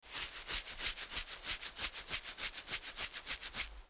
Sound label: Scratch